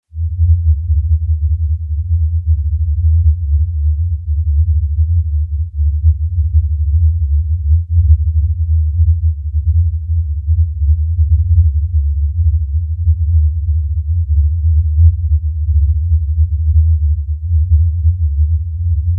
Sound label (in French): bruit rose